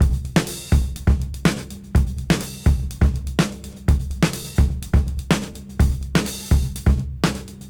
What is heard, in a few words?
Disk 125 BPM; AMG - Keith LeBlanc Phat Butt Jams